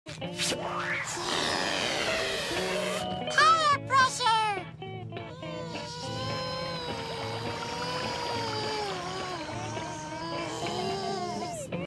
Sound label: tire pressure